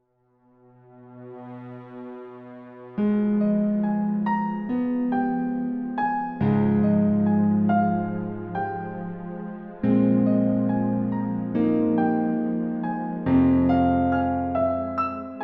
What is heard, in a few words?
piano